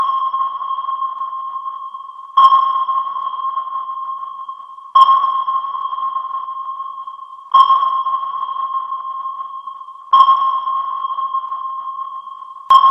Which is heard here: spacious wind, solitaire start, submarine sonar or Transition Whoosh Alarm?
submarine sonar